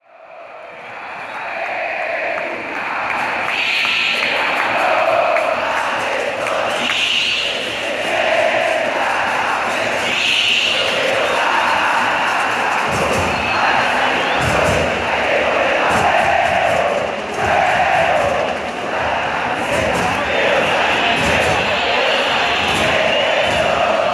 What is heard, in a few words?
crowd